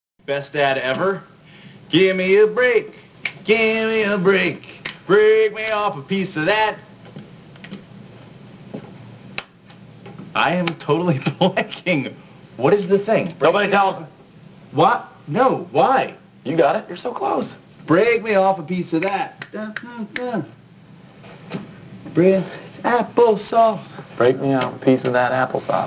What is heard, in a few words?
sings a jingle